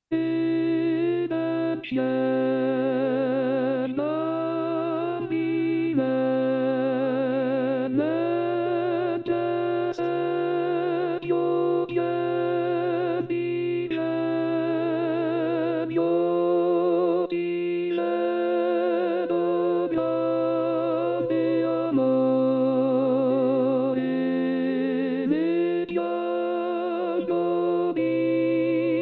Contralti; Re Del Ciel; Gruber; natale

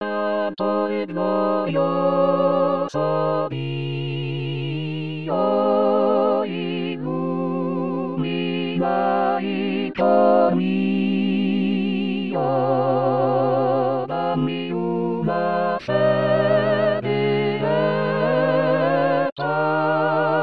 Coro

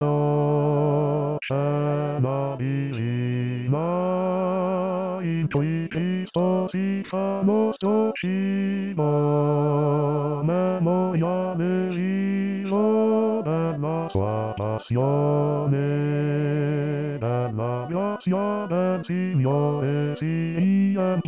Bassi; O Cena Divina

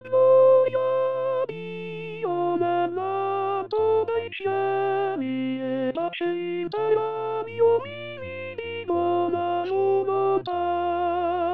Tenori; Gloria a Dio

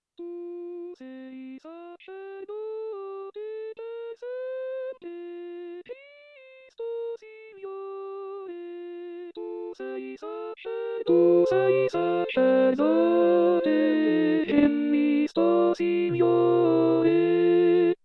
Tu Sei Sacerdote Contralti; anno liturgico

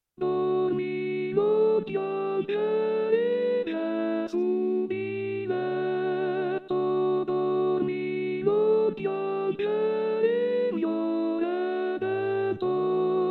Soprani; Dormi Non Piangere; natale

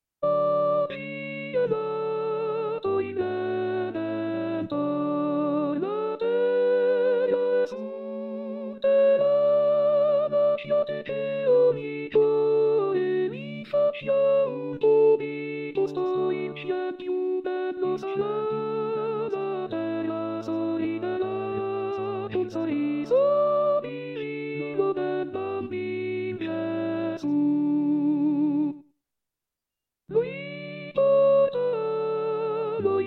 Soprani; Joy To The World; Handel; natale

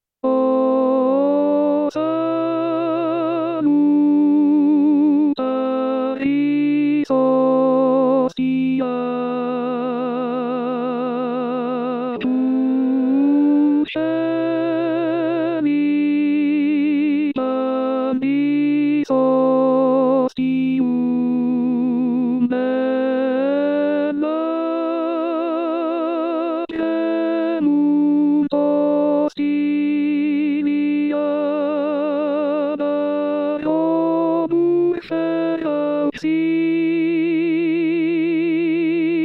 O Salutaris Hostia Tenori; Perosi

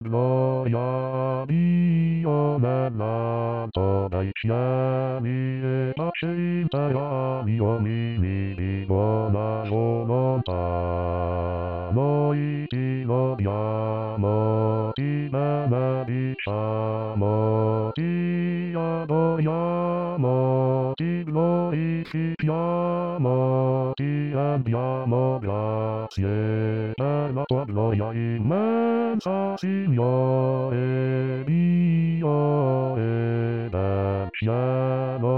Gloria a Dio Bassi

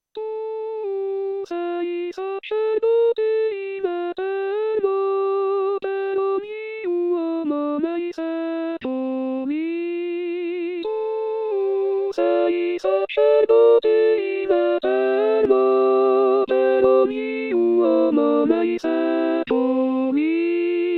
Tu Sei Sacerdote n Eterno Soprani; anno liturgico

